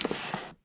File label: bow arrow slide; tf